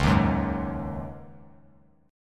C sharp Suspended 2nd Suspended 4th, C sharp, Bass Standard; strummed; JGuitar